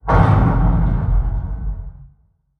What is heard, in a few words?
Structure Blunt; Damage; Barotrauma; Room FX